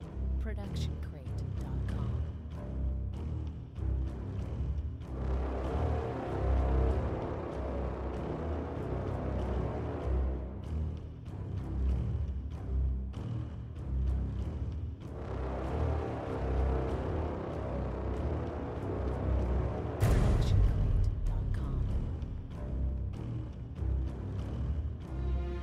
dark reveal; soundscrate

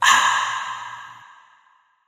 contented refreshed sigh; soundscrate

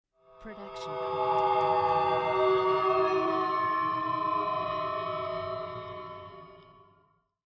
horror wailing bells; soundscrate

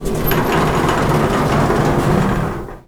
elevator door close; soundscrate

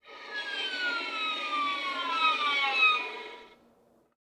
Violin Seagull Effect Fast

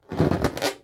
balloon rub short

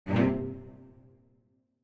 dramatic cello short; SoundsCrate

